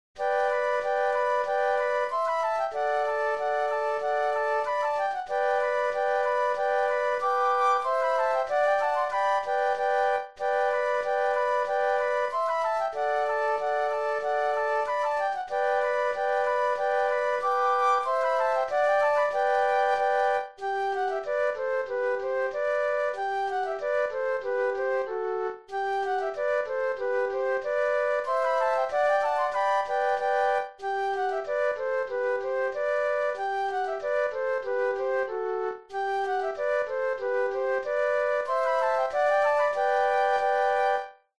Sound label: flute trio